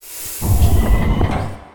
Hydraulic Door Opening

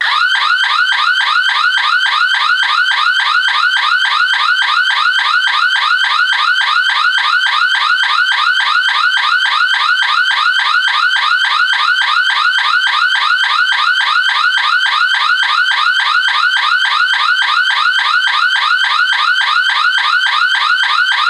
Burglar Alarm Siren Long Alert Warning Sound

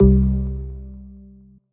Classic Organ

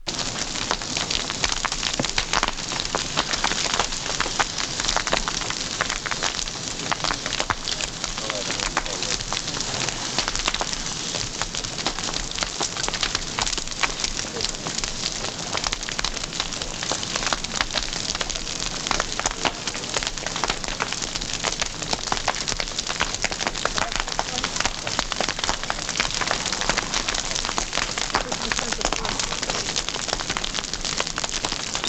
Fire Burning Cornfield

